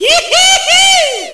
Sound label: Got Yummie; Apeiron